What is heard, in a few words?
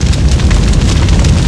fire small